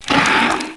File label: Behemoth Death Sound; Heroes3